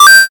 coin

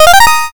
levelup

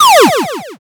laser

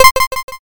toggle on

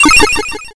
glitch

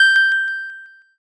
ding

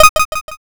toggle on

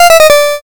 lose

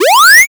charge

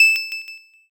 ding

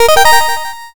start

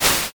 swoosh

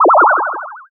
teleport

